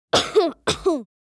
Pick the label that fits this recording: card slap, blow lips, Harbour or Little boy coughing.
Little boy coughing